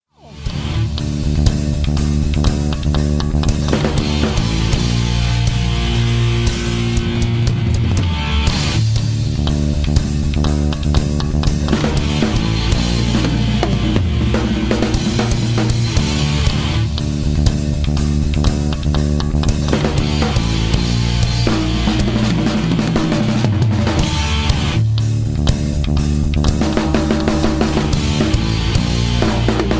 Prévia 2 Baixo, guitarra, bateria

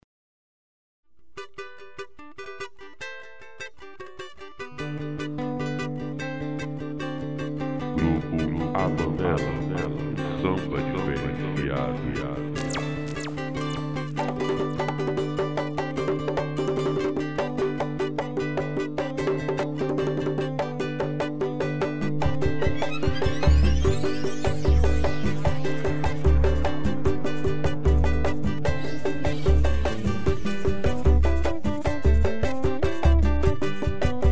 Estrumental

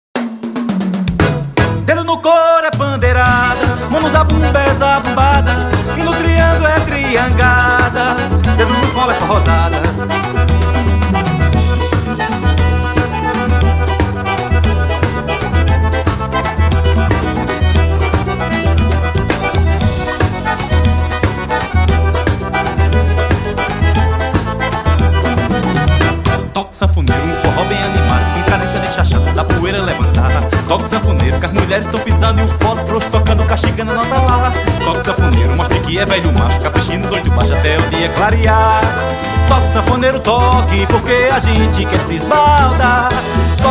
toque de fole

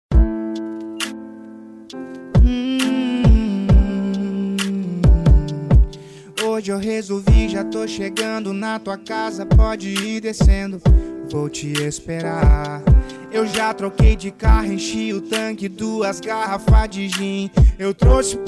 A Noite Promete (Trap)

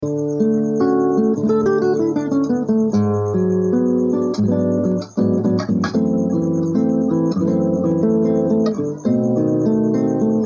violão e voz; Incidental

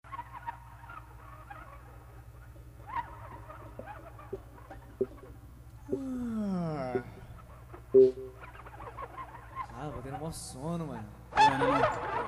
cancao de ninar

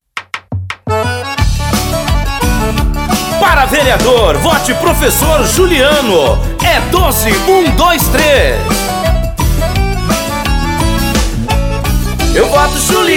jingle parodia; politico 2020; vereador

